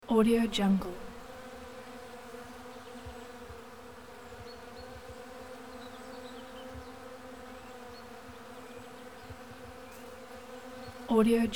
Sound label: bees in tree birds forest birds; پرندهها و زنبورها